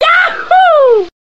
yaahooo

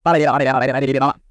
flapping lip sound

